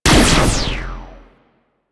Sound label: Flux Rifle Shot; Crp Laser Rifle; BE Flux Rifle